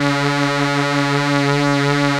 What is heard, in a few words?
3100 SAW C#4, Korg PS3100; Back In Time Records; Korg Universe VOL-1